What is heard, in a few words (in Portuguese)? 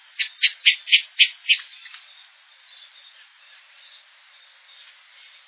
guaracavucu